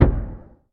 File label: BUTTON Deep Smooth Click; mono; Universal Sound FX